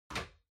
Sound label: Lighter Far Put